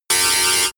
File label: tile cutter synthetic